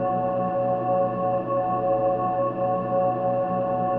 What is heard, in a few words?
chorus